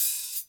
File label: HIHAT; Best Service Hyper Dance